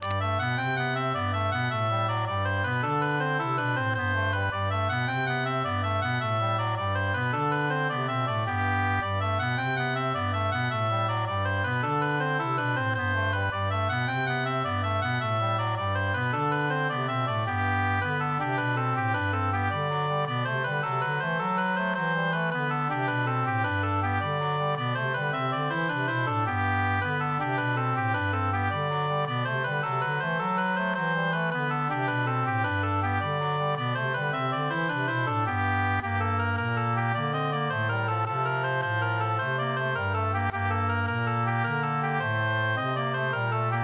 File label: Gaita Lolina Tutti